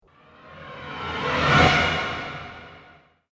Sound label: Evil Strike Transition